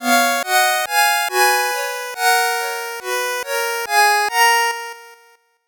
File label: 8bit jingle slow